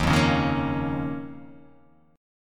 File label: D sharp 7th Suspended 2nd